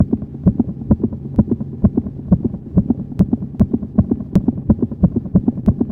battement de coeur

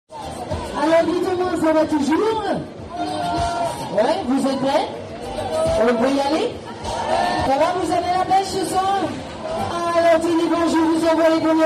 fete foraine

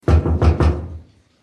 frapper à la porte

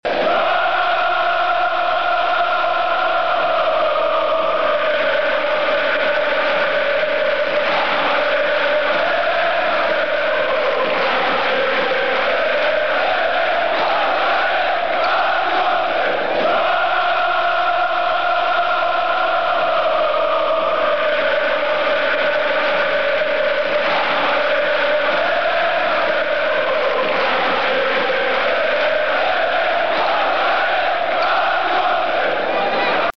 public stade foot

